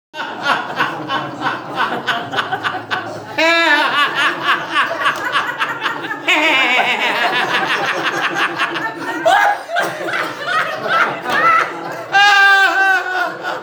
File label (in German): lachen